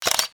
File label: shutter, photobooth